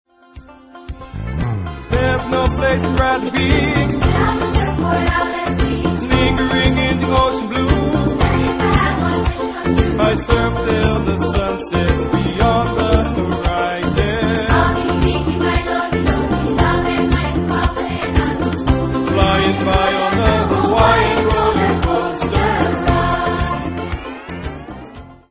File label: roller coaster ride; lilo & stitch; hawaiian